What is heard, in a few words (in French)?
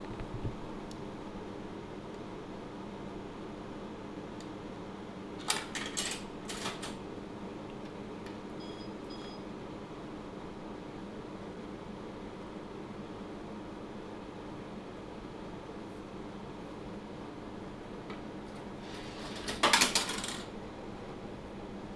Distributeur snack; ZoomH2N; Bureau